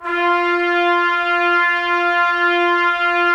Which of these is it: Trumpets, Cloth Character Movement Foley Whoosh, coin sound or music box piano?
Trumpets